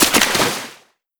foot wet slide start, footsteps

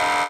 gun reload; World of Tanks

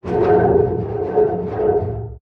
damage vehicle; World Of Warships

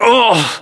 pain, voiceovers; localized english

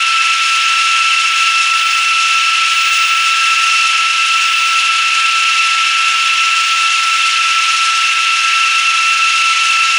Bf-109K-4 Whistle; DCS

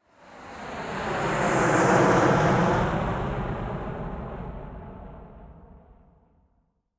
plane passby jet layer

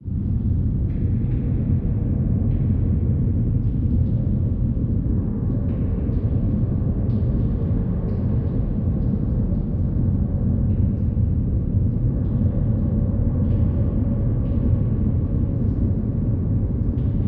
submarines engines; World Of Warships

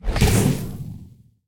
depth bomb; World Of Warships

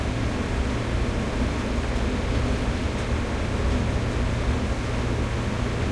maus engine int rpm, engines tanks; War Thunder

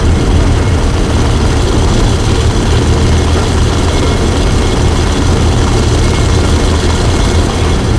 panzer3 engine int rpm 33 load, tanks; War Thunder

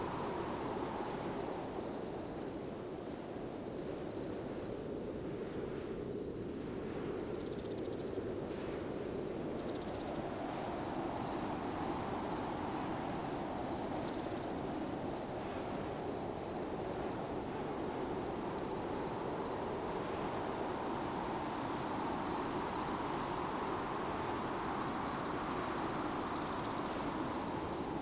ambience desert night; Rust

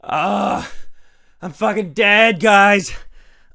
hurt neardeath; Escape from Tarkov

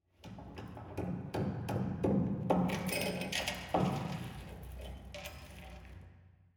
hangar layer

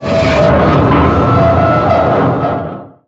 damage vehicle; World Of Warships

